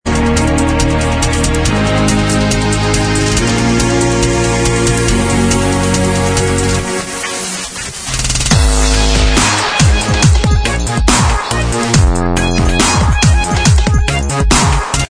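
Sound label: dubstep remix instrumental, backing track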